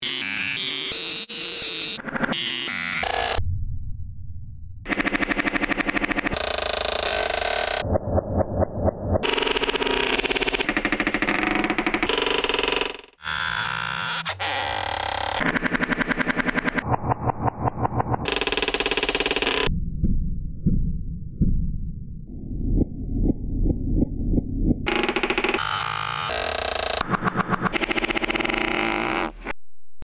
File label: noise